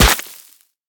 concrete impact bullet; physics

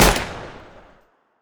hkp2000, weapons; Counter Strike Model Pack; CS:GO

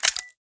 money collect; Counter Strike Model Pack; CS:GO; survival

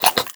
breath out, headcrab

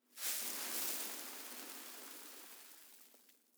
sand gust; ambient; Counter Strike Model Pack; CS:GO; dust2

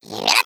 eggbot jumps, taunts; player; Portal 2

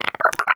idle chirp, headcrab, creatures

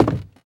wood, player footsteps

